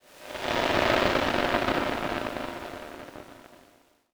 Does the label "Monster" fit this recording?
No